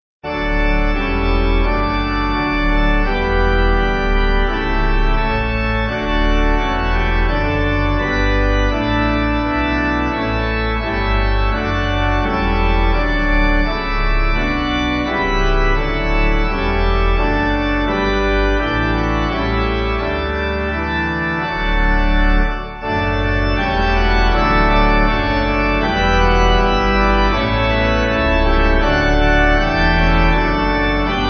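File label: Processional Pipe, Free Music Backing Files for Churches - Piano, Band, Pipe Organ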